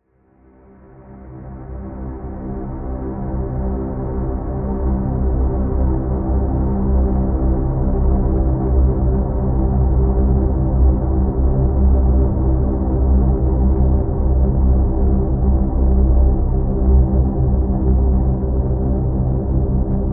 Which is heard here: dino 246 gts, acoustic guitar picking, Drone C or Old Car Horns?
Drone C